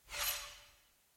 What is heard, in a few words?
lobby page upgrade close; strawberry